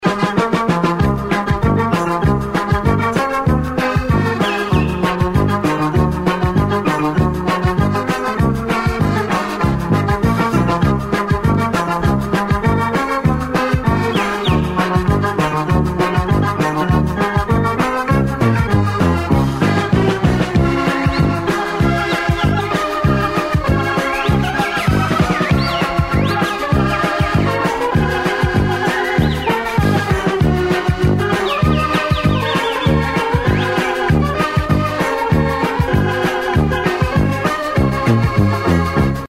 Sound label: Electronica Dance